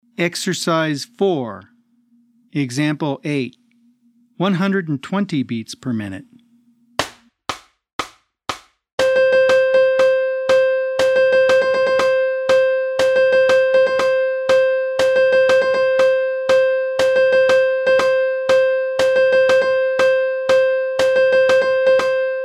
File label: 120 BPM